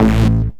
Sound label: Synth Shots; Vengeance Samples